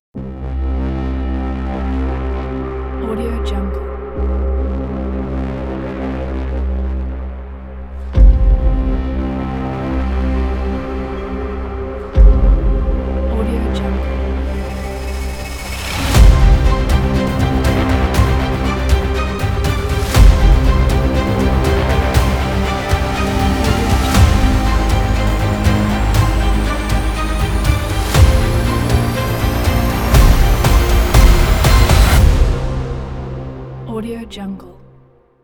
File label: an intense trailer; wildsound